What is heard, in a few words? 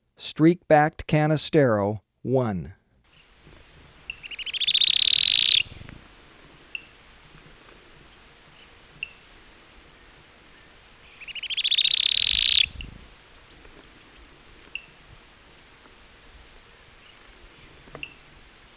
Streak-Backed Canastero, Birds of Ecuador; S. America